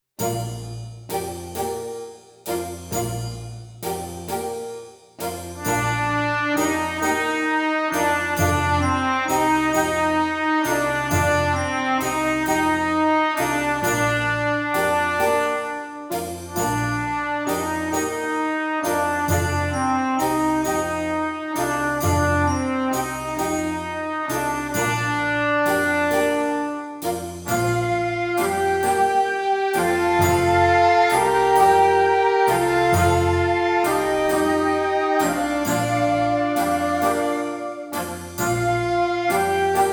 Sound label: Barcarolle for Beginning Band; Offenbach